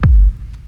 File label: fat steel kick drum sample g key, Royality free kickdrum tuned to the G note